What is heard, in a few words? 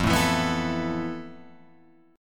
F Major Sharp 11th, Guitar, Open F; strummed; JGuitar